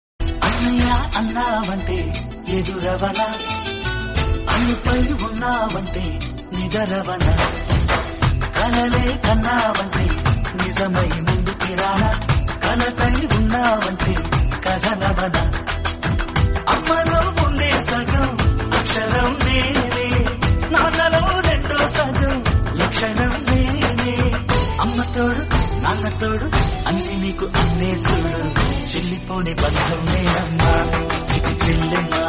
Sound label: telugu song; annavaram